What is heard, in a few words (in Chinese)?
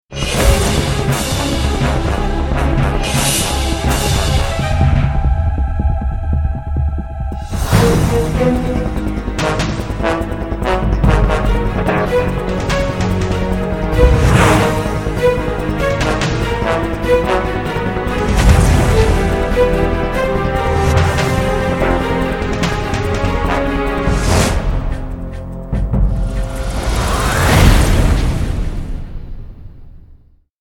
片头音乐